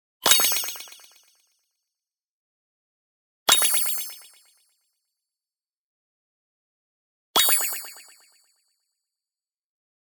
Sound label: BLING Ping Tonal Wobble; boom library; Anime Essentials